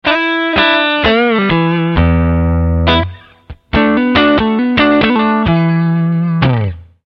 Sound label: Strat, Gitarre; Classic Stratocaster; E-Gitarre; Fender Stratocaster; Elektrische Gitarren; versch. Pickup-Einstellungen